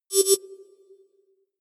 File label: computer sound, signale; soundpool